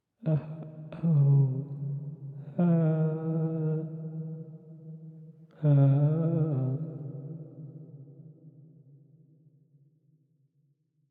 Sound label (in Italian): lamento uomo; Inquisitor 3